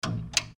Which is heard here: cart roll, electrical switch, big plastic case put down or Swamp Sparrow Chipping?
electrical switch